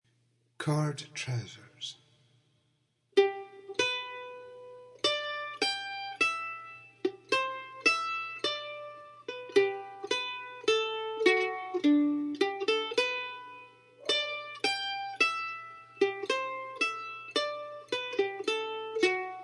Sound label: Mandolin